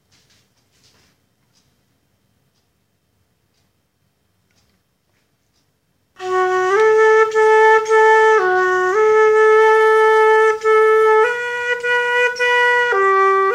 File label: flute